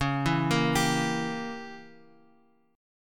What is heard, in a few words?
Db Diminished 7th Db down Bass Standard; arpeggiated; JGuitar